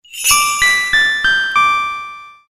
christmas text message ringtone

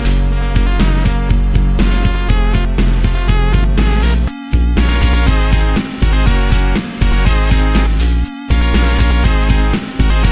remix ringtone

